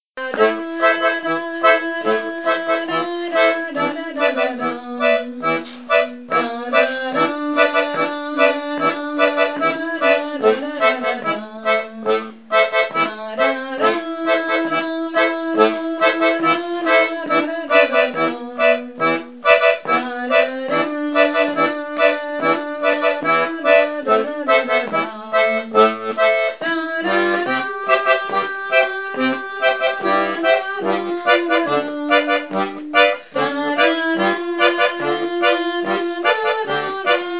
passo doble rythmique; Paso doble rythmique

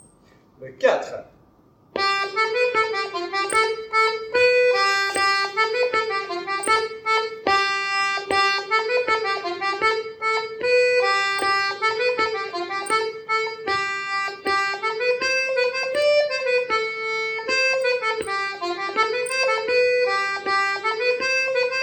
rondeau en chaine; suite de 4 rondeaux en chaîne

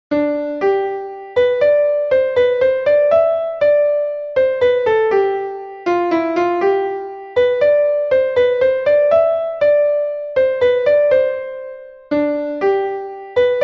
Valse suedoise

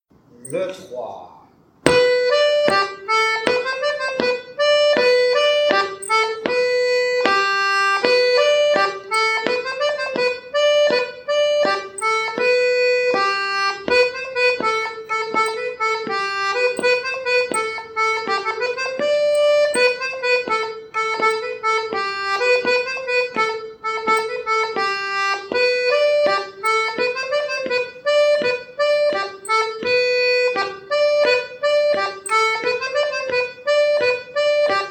rondeau en chaine; suite de 4 rondeaux en chaîne

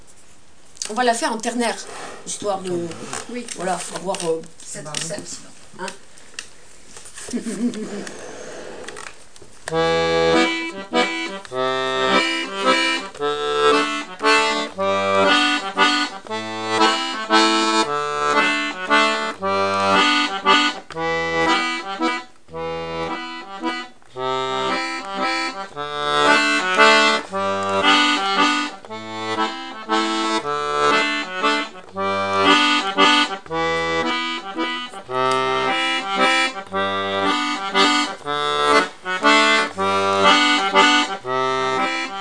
Rythm ternaire binaire; Salou

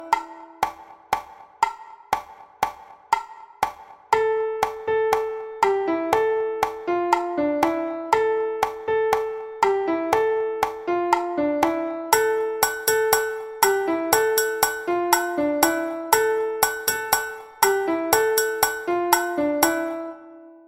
rythmique

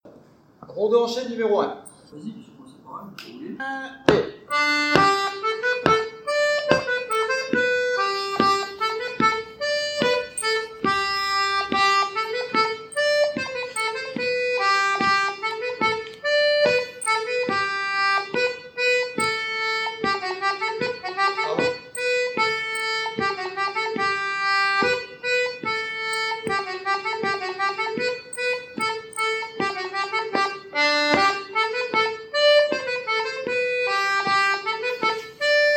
rondeau en chaine; suite de 4 rondeaux en chaîne

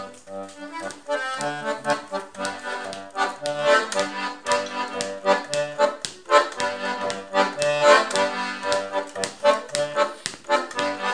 rythmique

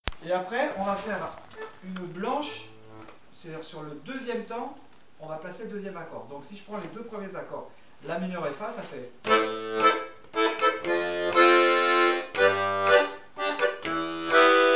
rythmique alambic

